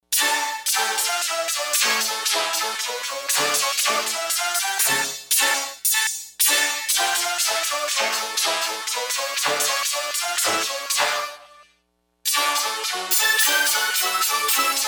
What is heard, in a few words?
Rough Harpsi; Digital Synthesizer